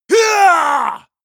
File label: male combat attack long